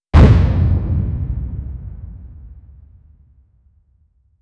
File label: dramatic vine boom sound in a tense moment